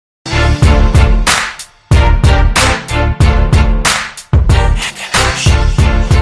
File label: hip hop beat